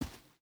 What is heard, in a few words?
footstep boots sand walk; Rust